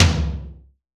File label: TOM, DRUM KITS; InVision Interactive; 40 Oz Phat Slammin Hip Hop